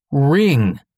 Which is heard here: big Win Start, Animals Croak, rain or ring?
ring